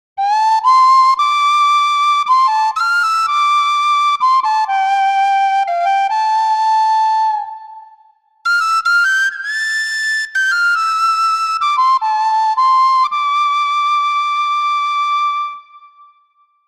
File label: Tin Whistle; Kontakt Wind Paks